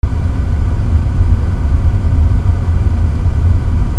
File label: INDUSTRY